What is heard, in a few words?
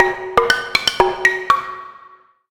Marimba